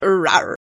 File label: rawr